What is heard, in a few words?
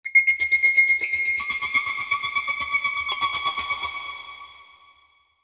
Chopper Eerie Flange Reverb; Blastwave; Chopper Sound Effects